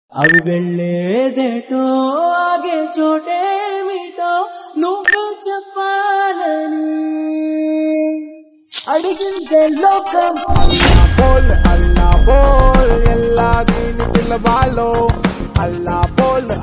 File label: telugu ringtone